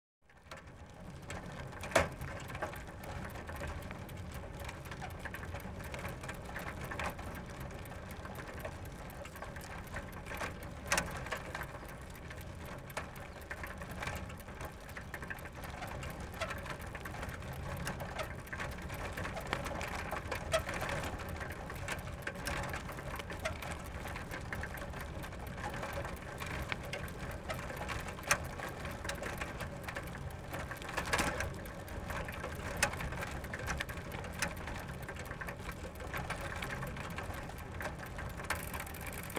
Bicycles, Slow, Continuous Run On; BBC Sound Effects Library

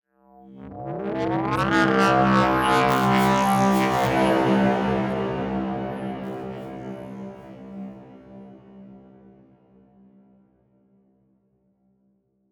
Electronic Pass

